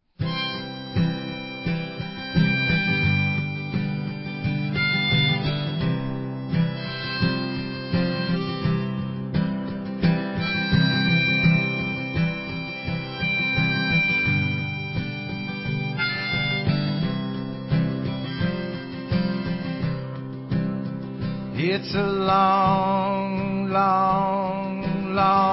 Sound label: Long Way Home Acoustic